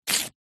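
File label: household instructions pamphlet glossy paper rip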